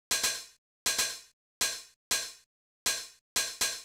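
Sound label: TR909 Open hi-hat; hydrogen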